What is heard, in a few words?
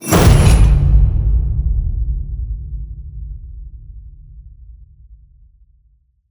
HIT Metal Crash, Cinematic Hits Designed